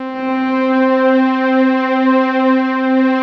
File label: rich mellow, Rich Pad; Optical Media International; Sonic Images Library